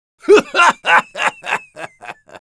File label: Sniper laugh long, es